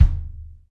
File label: Folk Kick; Roland BK-5